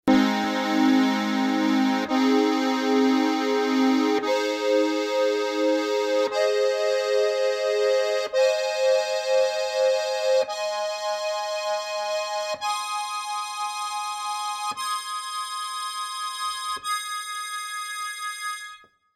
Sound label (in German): B Es As Des Akkord, harmonika